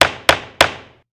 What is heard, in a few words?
gavel three